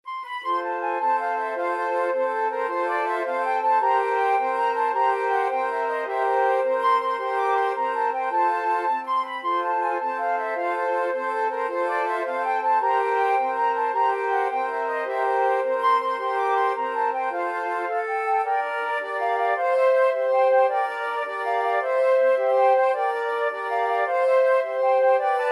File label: mexican hat dance, flute quartet